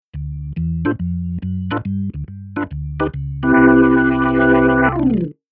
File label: Keyboard Organ Drawbar